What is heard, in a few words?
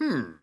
Outro; eldorado; gonzo